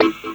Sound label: CLAVINET; Ragga & Dub Reggae